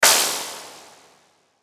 Hit Electrical Clang, Button Electronic Blurp Warp Delay; Production Element Imaging Element Accent Transition; Blastwave